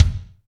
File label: BD, BD REAL